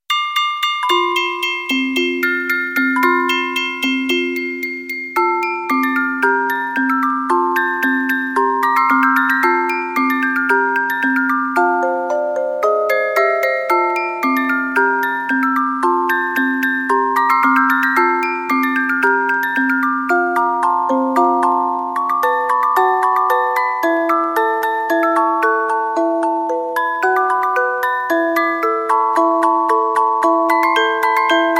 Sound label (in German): Spieluhren, Weihnachten